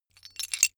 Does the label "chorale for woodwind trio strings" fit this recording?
No